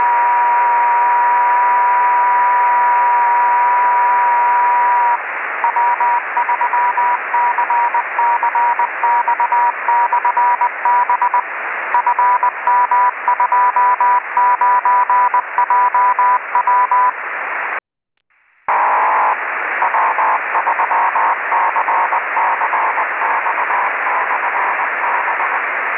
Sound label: b 10ghz, microwave